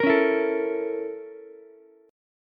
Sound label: A sharp Minor Major 7th Flat 5th A sharp Ukulele; A#mM7b5 strummed; JGuitar